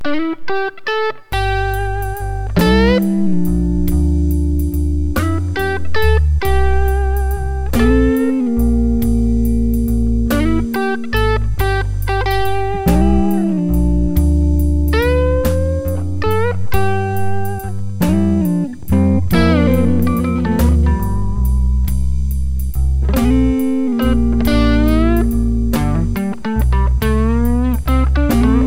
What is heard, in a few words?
blues